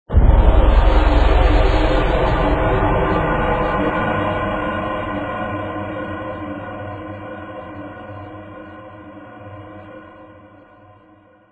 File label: Spooky, Haloween; Rosegarden